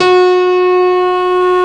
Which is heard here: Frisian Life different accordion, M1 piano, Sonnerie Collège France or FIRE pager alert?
M1 piano